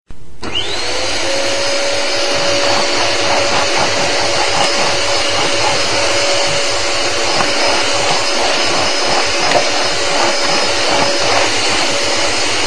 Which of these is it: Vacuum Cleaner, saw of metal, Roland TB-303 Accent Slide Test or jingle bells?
Vacuum Cleaner